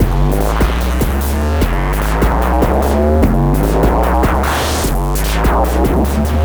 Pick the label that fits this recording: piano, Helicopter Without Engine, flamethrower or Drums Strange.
Drums Strange